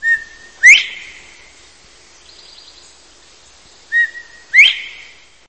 Colinus virginianus; Chordata; LandBirds

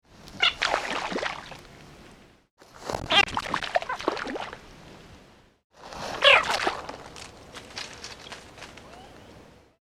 Lithobates catesbeianus alarm

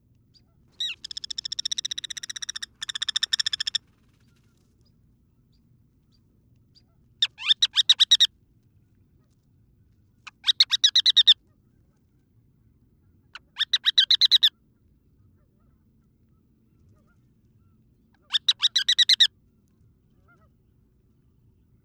Arenaria interpres, Water Birds; Chordata

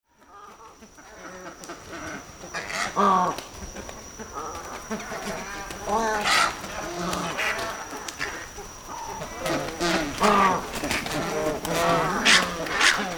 Eudocimus albus, Water Birds; Chordata

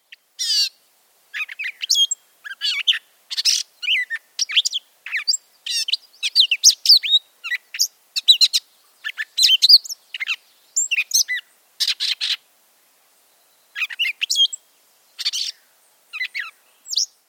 Dumetella carolinensis; Chordata; LandBirds

